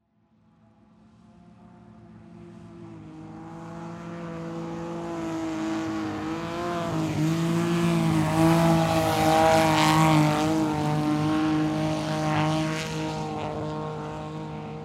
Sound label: Speedboat